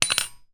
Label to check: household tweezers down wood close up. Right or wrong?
Right